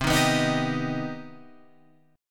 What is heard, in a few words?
C Minor Major 7th down Guitar Open F; strummed; JGuitar